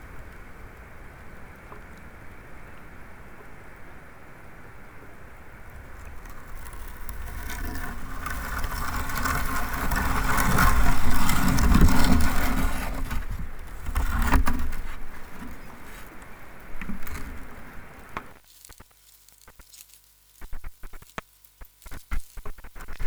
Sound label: Eruption; scripps